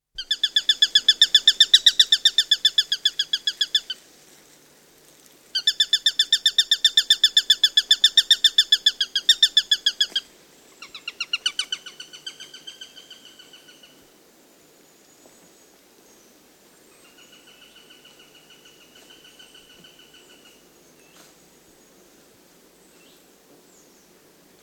Sparrow hawk, alarm calls from a pair, female more prominent, chaffinch in background; Bird; Chaffinches; BBC